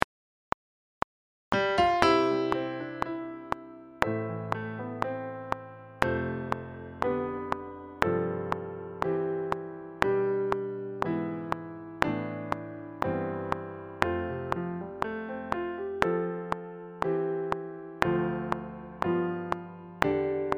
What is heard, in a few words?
santafe accomp